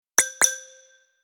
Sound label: Cartoon eye blink